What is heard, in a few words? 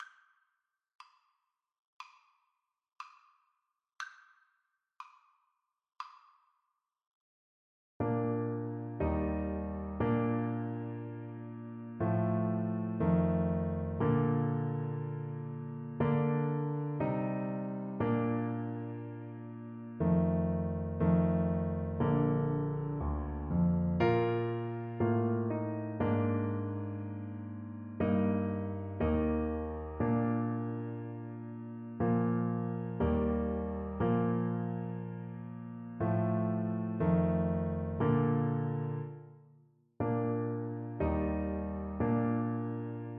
Trumpet